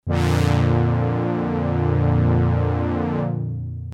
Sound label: brass original